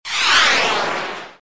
rocket flying

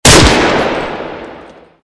FAL single

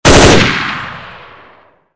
m16 burst

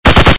m60 mgun

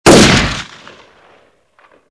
hunting rifle

